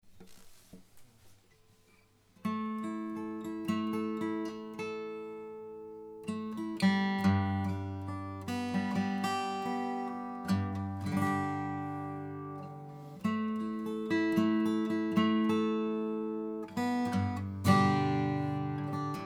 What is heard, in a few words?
flatpick nylon